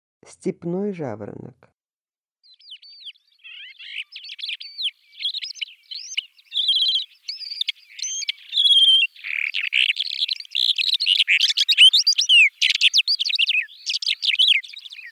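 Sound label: stepnoy zhavoronok voice, calandra lark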